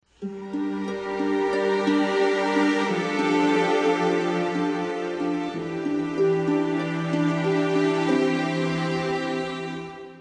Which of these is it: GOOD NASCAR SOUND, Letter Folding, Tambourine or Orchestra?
Orchestra